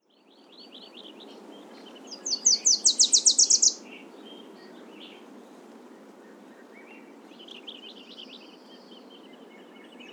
Wilsons Warbler, Cardellina pusilla; warblers

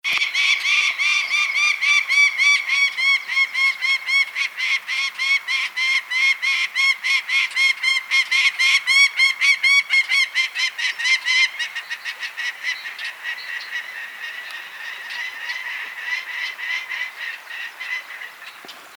Prairie Falcon, Falco mexicanus; raptors

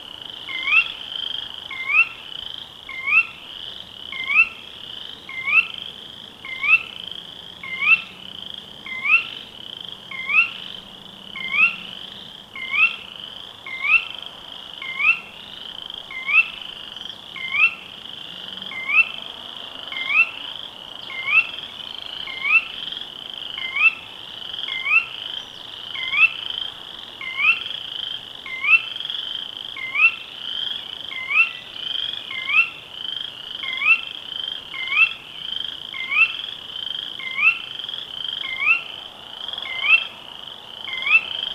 Sora, Porzana carolina; shorebirds rails

